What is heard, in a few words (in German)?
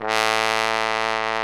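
POSAUNE VIBRATO; Best Service; Alpine Volksmusik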